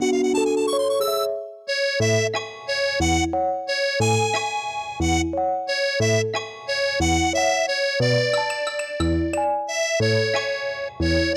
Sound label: Aircheck, Retro